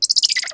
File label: SQ Monkey; DOODSHOOFDAAP; ANIMAUX